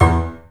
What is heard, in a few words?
PICK F2; InVision Interactive; The Piano